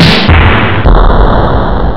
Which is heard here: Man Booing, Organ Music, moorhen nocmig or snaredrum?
snaredrum